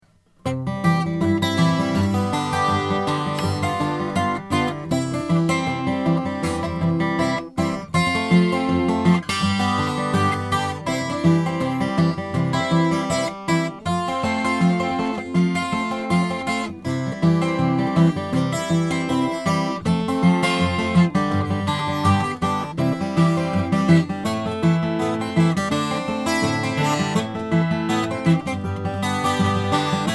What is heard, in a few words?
taylor 12 mahogany; 1995